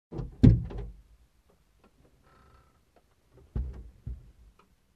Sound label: Opening Trunk